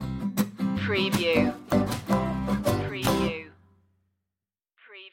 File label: zydeco stinger